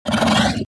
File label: Monster Roar